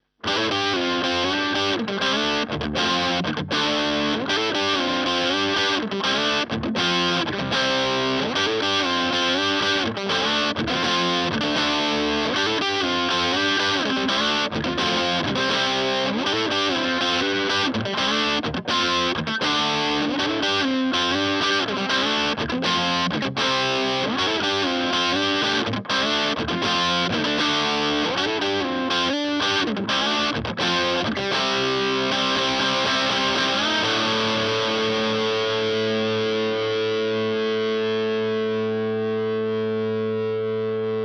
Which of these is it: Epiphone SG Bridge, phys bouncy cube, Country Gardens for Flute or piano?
Epiphone SG Bridge